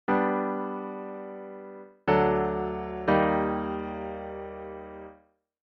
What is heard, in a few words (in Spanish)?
Rota V A B Vi 2 G, cadencia; auditivo de cadencias autenticas plagales rotas y semicadencias; Identificar la cadencia